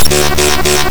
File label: Sci-Fi Alert